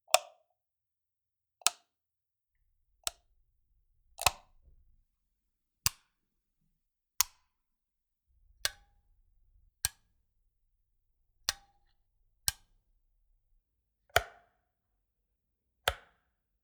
Light Switches

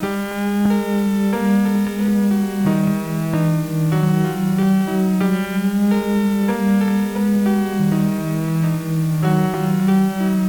Old Sick Piano

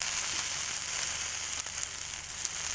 Chorizo On The Grill

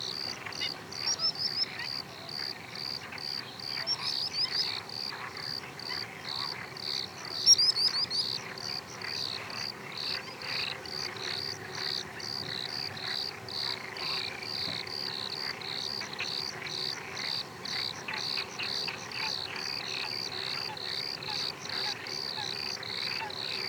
Dusk Mosquitos; Lobo

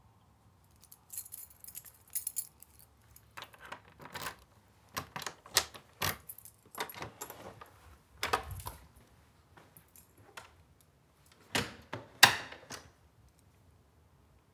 House door out in

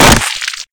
Messy Splat

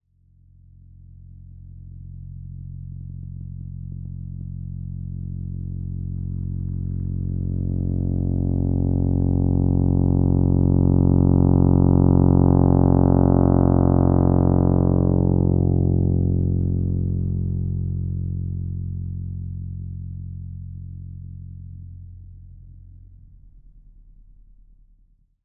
Trumpet

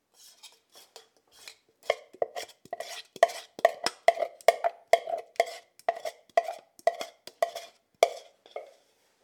Scoop Spoon Pot

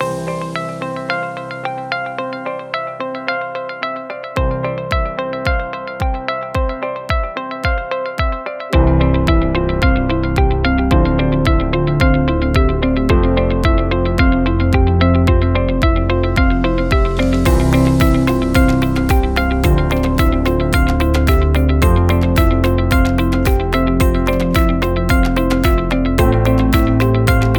Inspiring Background Bpm 110 Loop

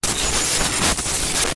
Glitch Transition

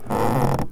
Creak

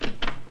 VCR Switch

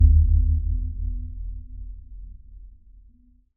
Cinematic Boom

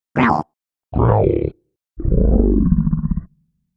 Growl In Growl Pseudostereo

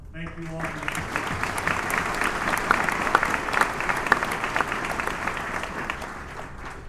Applause

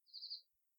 Bird

